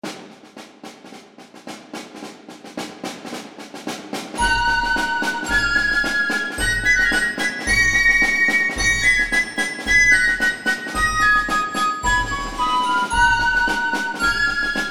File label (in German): Schwedischer Kriegsmarsch kleine Besetzung